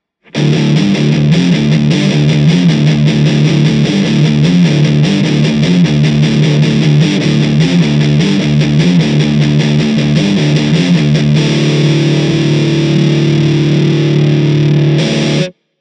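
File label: blackstar ht metal 60 overdrive 2 british shure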